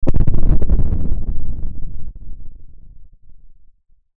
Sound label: Static Soft Distortion Chopper, Static Noise Warble Ascend; Production Element Imaging Element Accent Transition; Blastwave; Static Sound Effects